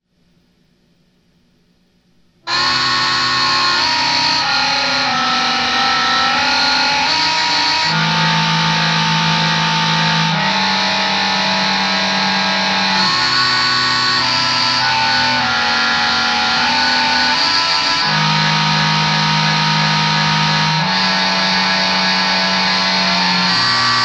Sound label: Distortion